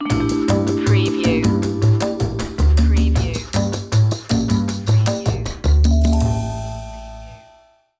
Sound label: jazzy opener